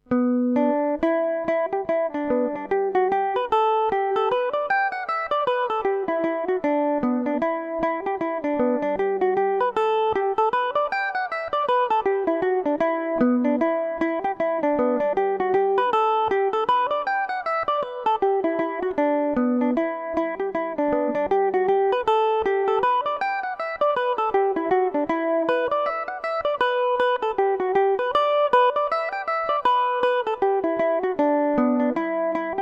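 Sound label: E dorian revelation, Irish mandolin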